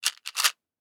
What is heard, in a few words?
Plastic Pills Bottle Handling Clatter